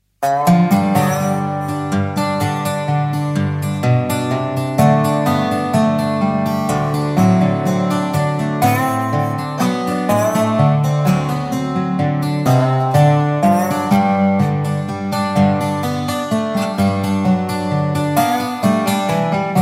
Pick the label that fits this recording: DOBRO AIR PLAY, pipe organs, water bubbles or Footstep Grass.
DOBRO AIR PLAY